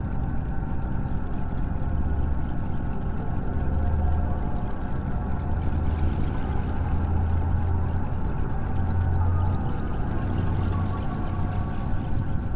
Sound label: sounds music; Halo Combat Evolved; Xbox